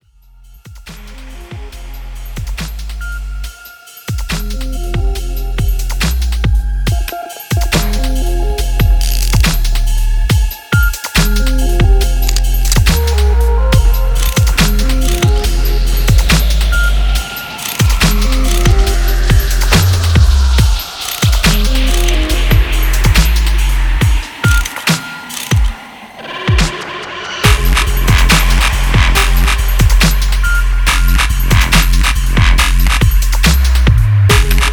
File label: DUBSTEP